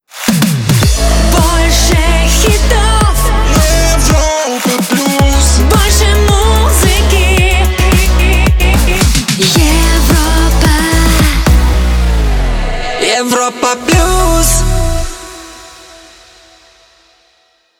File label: Jingle; Europa Plus 2022